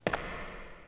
haa

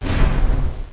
BUL EXPL; Virus

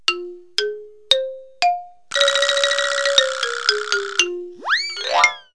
Halloween Music (Long); Wild and Wacky Sound Software; 1,000 Sounds; Comedy Accents

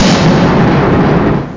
bang; eldritch

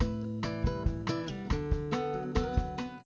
Bossa Lounge, ringtone

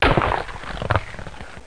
hd crush; Total Chaos Nukem 3d Pack; duke

